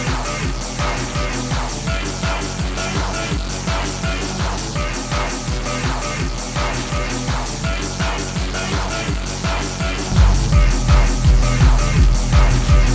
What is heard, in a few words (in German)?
tiefen anhebung